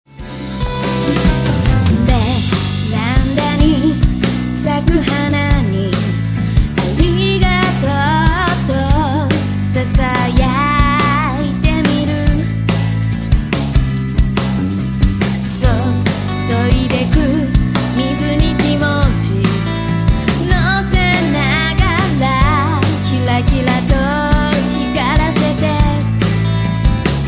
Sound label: music